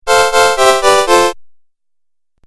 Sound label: Game Music Phrase I, video game; Game Music Phrase I-V2